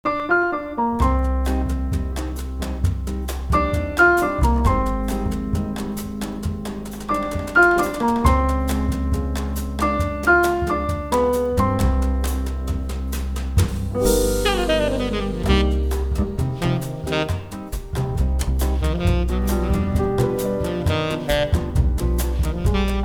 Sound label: Gentle Rain